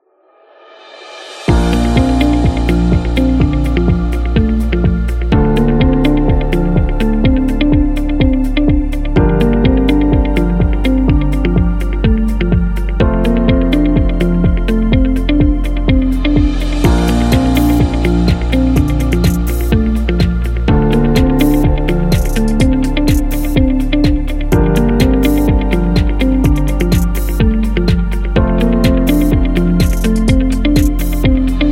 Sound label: Fashion Beat